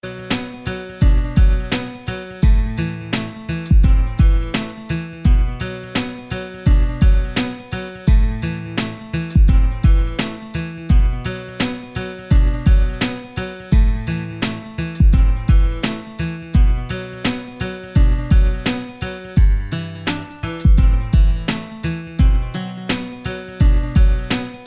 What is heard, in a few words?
Beat